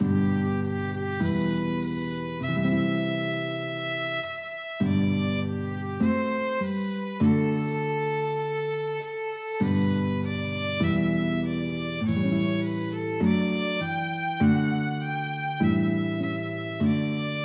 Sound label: violin guitar, duet; crown him